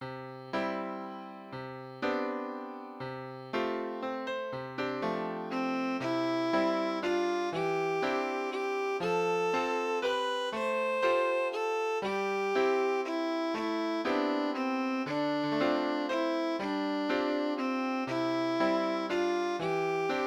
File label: violin and piano; Sweet Hour of Prayer